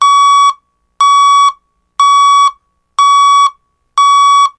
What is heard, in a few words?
siren